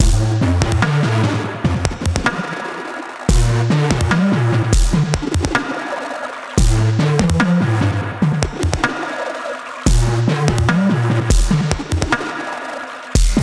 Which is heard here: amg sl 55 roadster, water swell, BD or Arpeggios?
Arpeggios